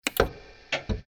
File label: machine scream